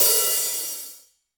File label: airy open hi hat d key